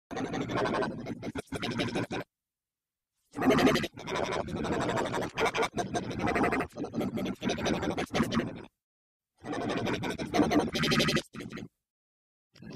Alien

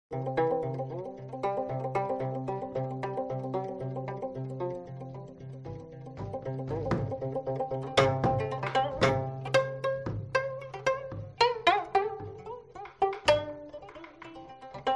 Geomungo, ساز سنتی کره‌ای

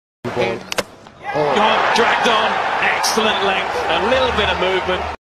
Cricket; ورزش

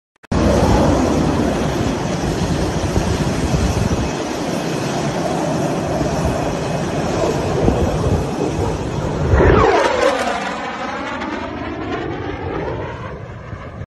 HESA Saeqeh; جنگنده هسا صاعقه

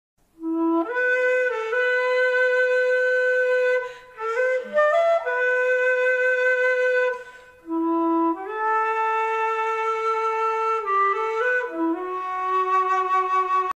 Bamboo flute

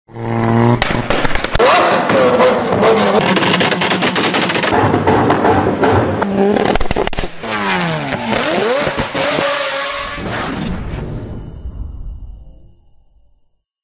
backfire

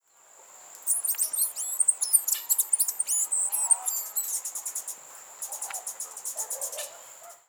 Bee hummingbird; مرغ مگس زنبوری

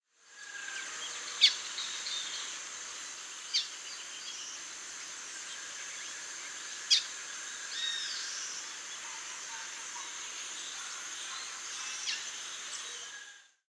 Wing barred seedeater

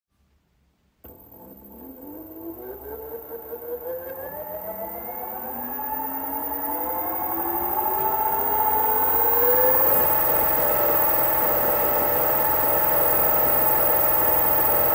electric scooter; اسکوتر برقی

